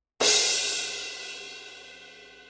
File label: Loud Hit